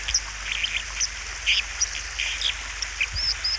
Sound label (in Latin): Cinclus cinclus, merlo acquaiolo; fauna